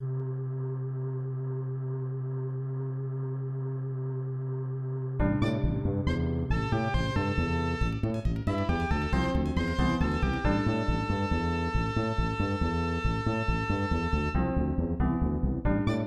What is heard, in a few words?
Level Music; Spindizzy Worlds; SNES